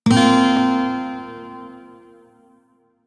Shawzin Pent Min Chord K; Music; Warframe

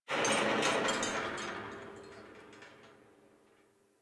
exterior tractor metal groan; Ambience; Props

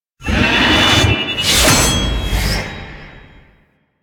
Mounted Shockwave Ability Charge Up

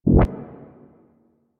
Corrupted Notes P; Warframe; Bard Quest; Bard Boss Fight

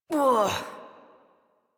Struggle Short Adult, Efforts; Warframe; Duviri; Drifter

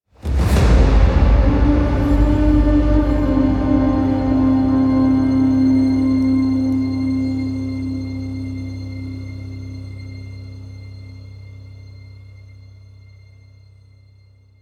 Back Room Reveal Stinger Cue; Warframe; DE; Entrati; TennoCon2023

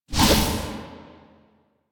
Archwing Scythe charge swing whoosh; Melee; Warframe; Exalted Archwing Scythe

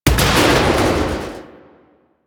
Fan Explosion; Warframe; Ambience; Corpus Gas City; Gameplay Remaster

